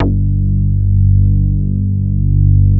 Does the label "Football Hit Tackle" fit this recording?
No